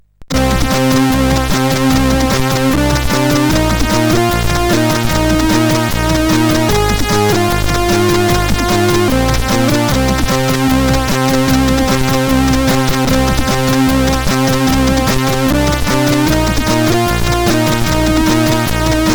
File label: Another Sound, CSG8580R5